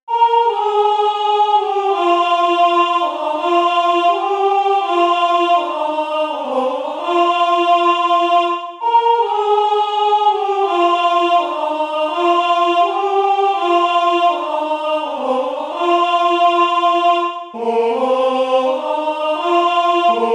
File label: hymn melody